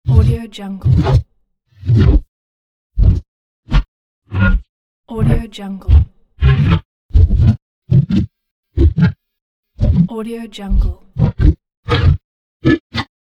game morph whip transitions pack

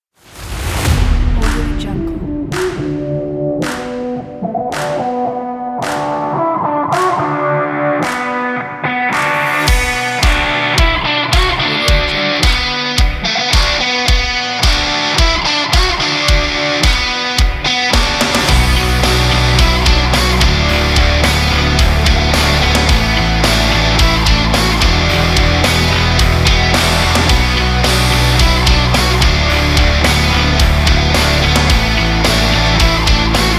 energy garage rock

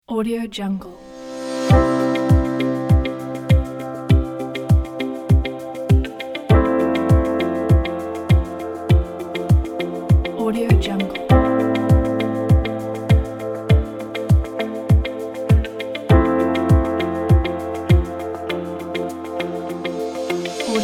chill corporate music pack

